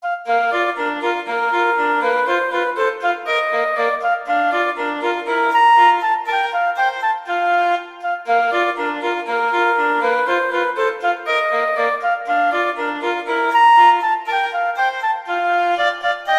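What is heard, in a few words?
Duet, horn; Mozart; Duets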